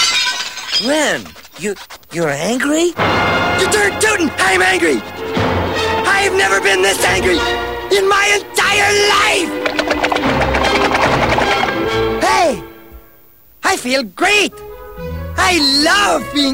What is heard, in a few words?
angry love